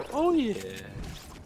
oh yeahhhhh

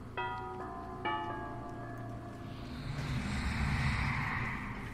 bell and cloak; dbd; wraith

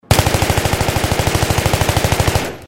m16a3 auto inside

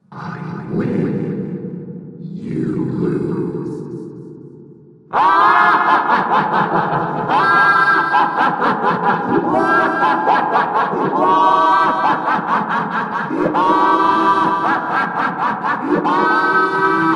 hahahaha; i win you lose

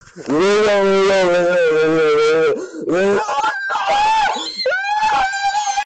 elelelelel

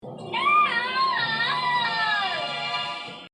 nooooooo; bluey

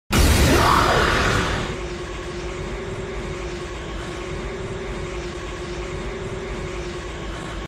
aura sound; super saiyan rose

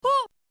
magpie; hoh

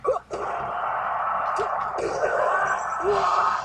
stomp knee

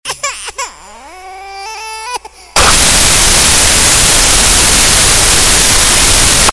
cursed demonic baby

